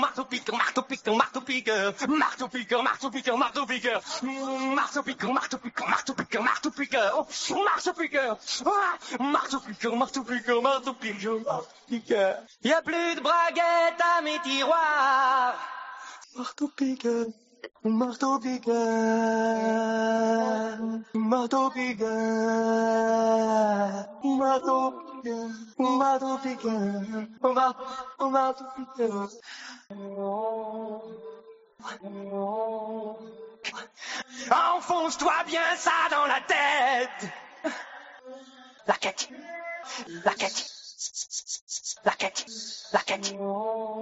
marteau piqueur

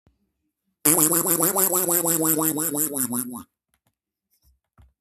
pet canard

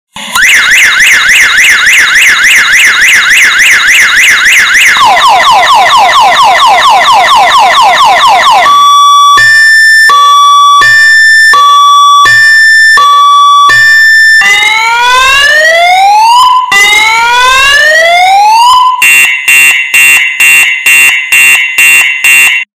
alarme

